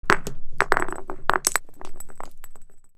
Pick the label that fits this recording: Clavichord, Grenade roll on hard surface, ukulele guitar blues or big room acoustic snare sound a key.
Grenade roll on hard surface